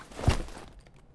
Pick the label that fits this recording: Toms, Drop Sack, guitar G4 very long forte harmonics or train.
Drop Sack